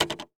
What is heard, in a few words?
Gear Foley Cassette Cover; FX; Cymatics; Eternity Sample Pack